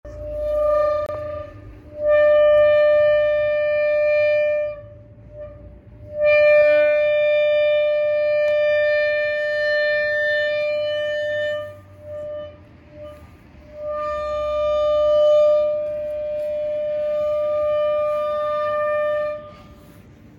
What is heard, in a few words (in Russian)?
skripy metalla